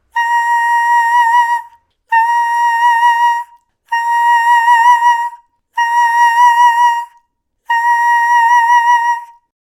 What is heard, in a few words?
high pitched sound